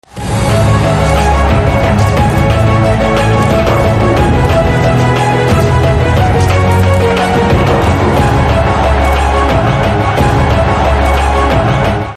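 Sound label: Trailer background music Ringtone; Zero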